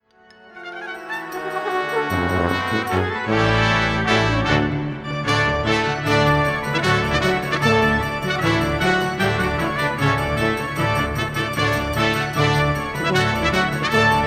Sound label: farandole, brass